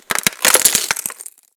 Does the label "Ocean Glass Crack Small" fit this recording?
Yes